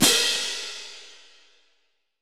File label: verby drum crash sample G key